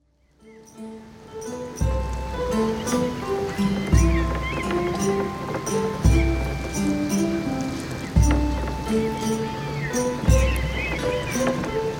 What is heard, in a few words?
Ambience music Day theme